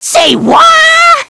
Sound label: whaaa; svencoop; boomario